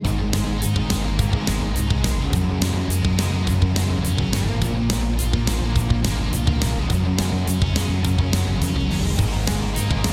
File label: Drum, Before; VoxengoDrumService